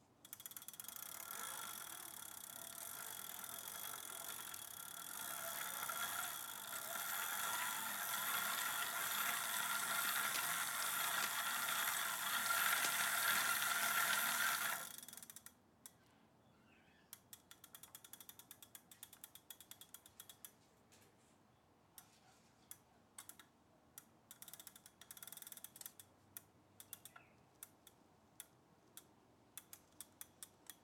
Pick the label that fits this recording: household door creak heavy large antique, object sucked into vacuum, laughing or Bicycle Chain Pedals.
Bicycle Chain Pedals